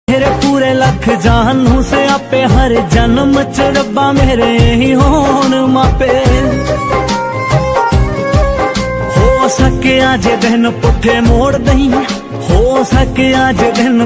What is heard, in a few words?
new punjabi ringtone song ringtone new punjabi sad song ringtone